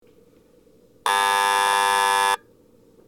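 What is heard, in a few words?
Mishima Futsukamachi departure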